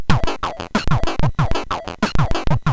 Scary Sequence, MOS6581R3; hvsc

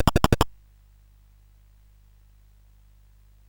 CSG8580R5, GAMES; Sammy Lightfoot

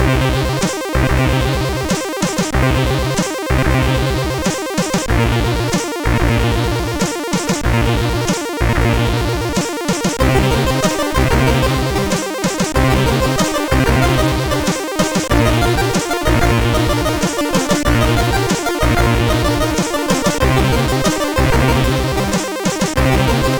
Crackle, CSG 8580 R5

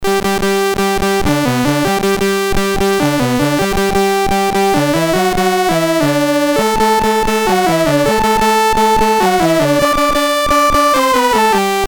MOS6581R3, GAMES; Solitaire

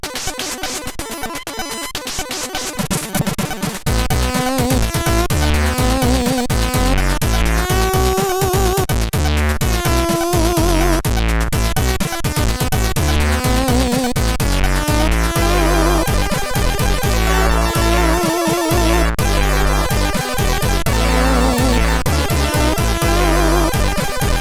Jazz, sid CSG8580R5; hvsc

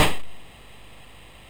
sid MOS6581R2, GAMES; Face Off

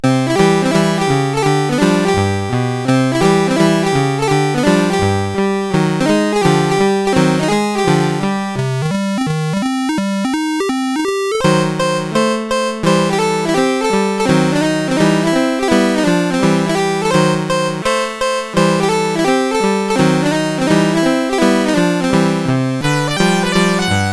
Maple Leaf Rag, CSG8580R5

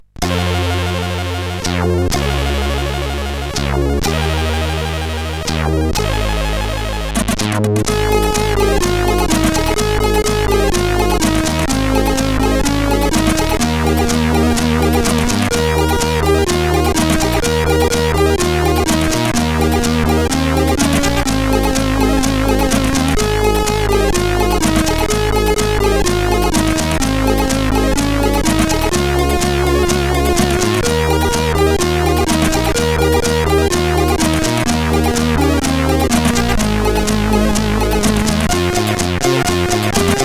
Tango, CSG8580R5

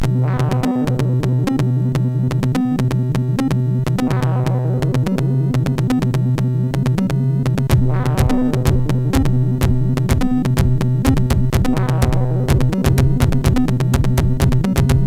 sid MOS6581R4; Inspiration; hvsc

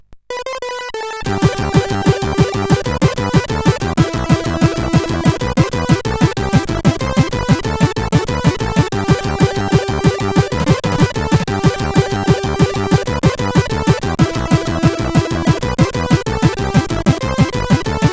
Humppa, CSG8580R5

